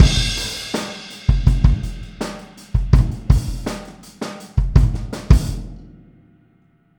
Boogaloo Outro Kick, drums, 82 BPM

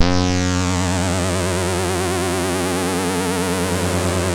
Synth and Organ; InVision Interactive; Keith Emerson

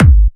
Trance Kicks, Bassdrums

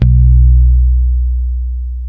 B 2, ultra pick, bass; Spectrasonics; Bass Legends CD 1

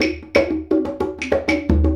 Combo, Essential Percussion

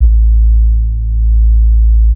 bass sub; Ueberschall; Techno Trance Essentials

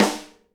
SNARE RIM, Live Snares

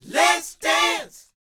LET, Vocal, R&B Dance, DANCE 120

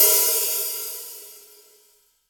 ROCK KIT, Real Drum Kit

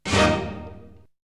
Hit; ZERO G - Datafile DISC 1

